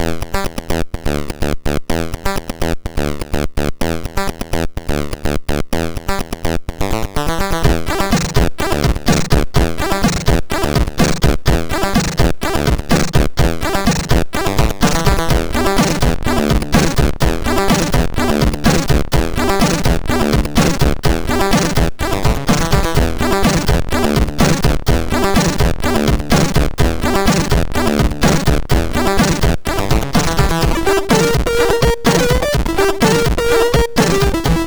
MOS6581R2, sid

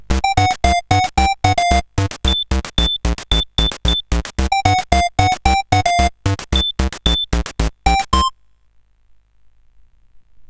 sid, CSG8580R5; Coco-Notes

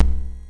MOS6581R4, sid; Pick n Pile

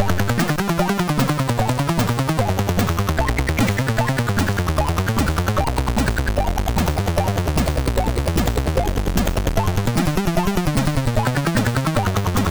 sid, MOS 6581 R3

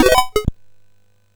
sid MOS6581R4

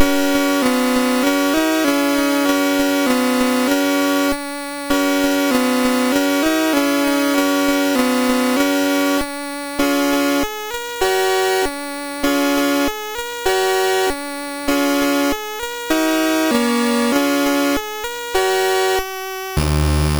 sid MOS6581R2

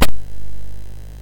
sid MOS6581R2; California Games

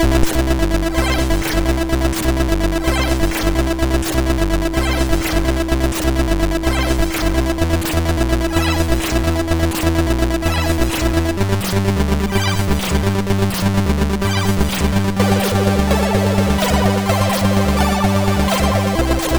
Construction Kit intro, sid MOS6581R3

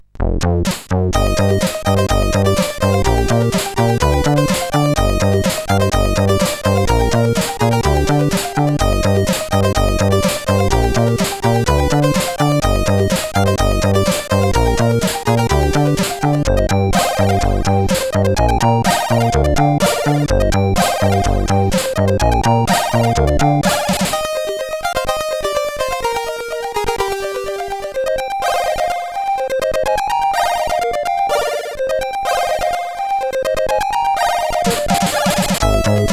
sid CSG8580R5

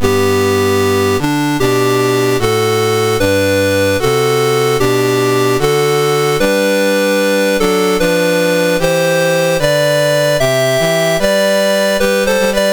Lithuania, National Anthems, MOS 6581

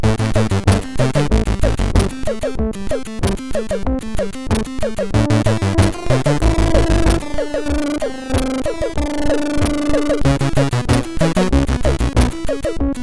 sid MOS6581R2

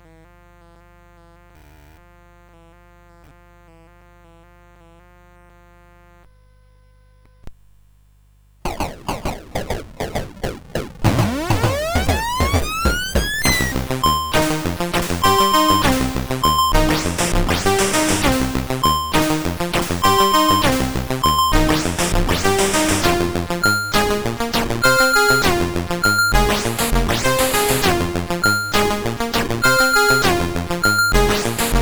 Synth; CSG8580R5

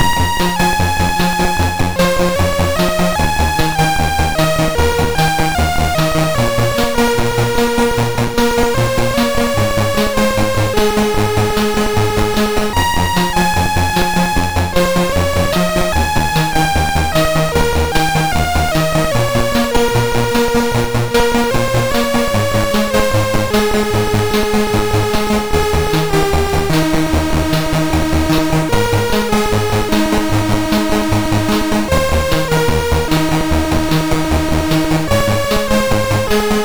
Synth Sample, MOS6581R3

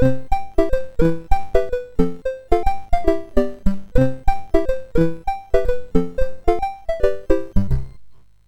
sid MOS6581R2

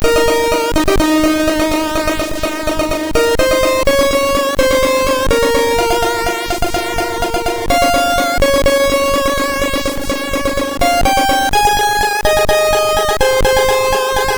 Main Theme, MOS6581R2; Space Harrier II

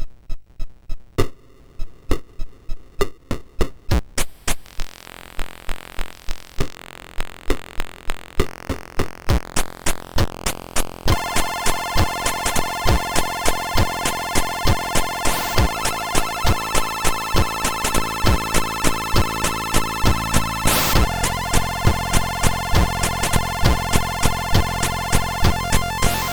3 Bit Chip; MOS6581R2

